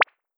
cute pop

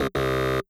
incorrect answer buzzer

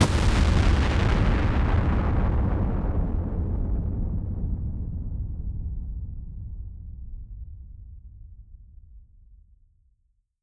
explosion large

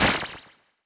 teleport middle disappear

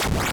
sword slash lowbit up

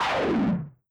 beast roar distorted

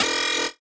laser gun

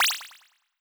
button

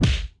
tsukkomi punch

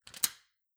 entrance sliding door unlock from inside

